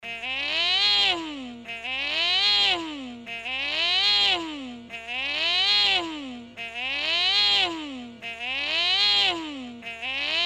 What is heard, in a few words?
Alarm Reverse